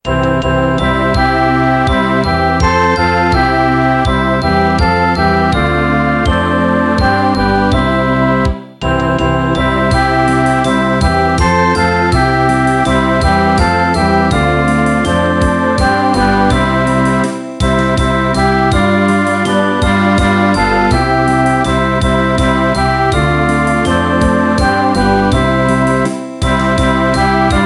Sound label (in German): kinderlieder am weihnachtsbaum die lichter brennen playback